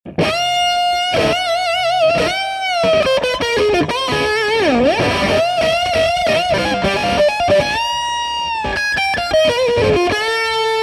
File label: guitar